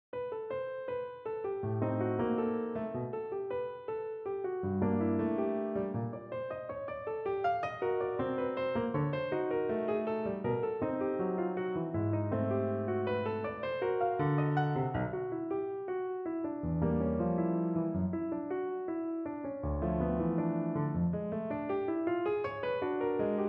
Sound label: TANGO; 6 Tangos: no. 1